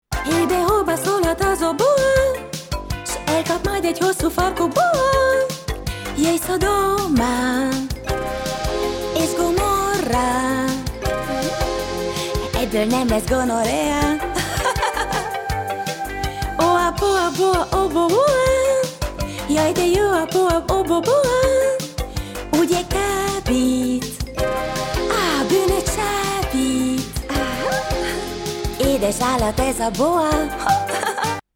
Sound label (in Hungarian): oboa